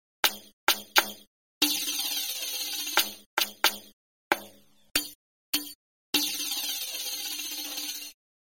Arabic Riq Frame Drum; Drumwavy; Syntheway

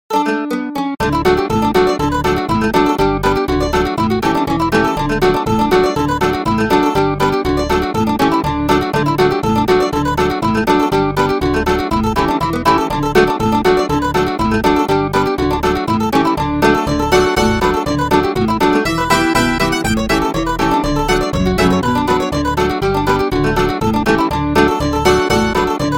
Acoustic Mandolin; Banjodoline; Syntheway

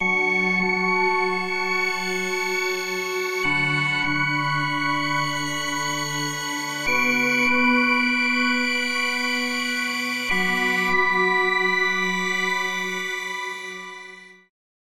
ATMO Enchanted Place; Phasewaver; syntheway

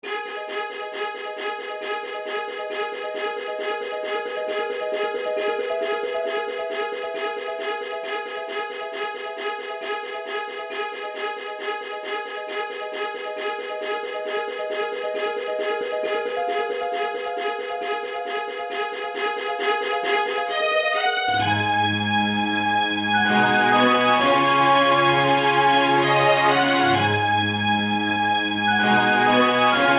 Flute Strings Harp Bells; Syntheway

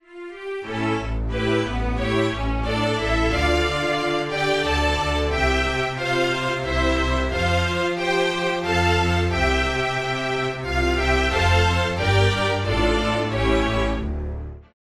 String Orchestra; Synthphonica; Syntheway

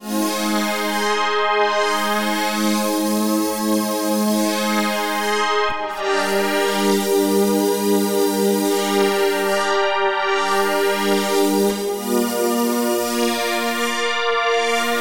PAD Ambience Texture; Phasewaver; syntheway

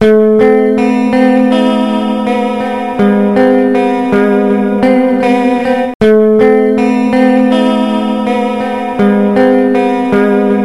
Stereo Flanger Railroad Crossing Bell Guitar Dry; Sonicircuit; Syntheway

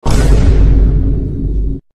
vine boom

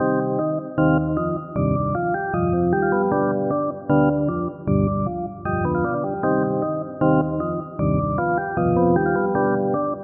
Rotary Speaker Distant Miking Organ Dry; AmpVibe; Syntheway

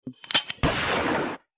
gun

